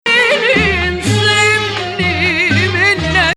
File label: Nahawand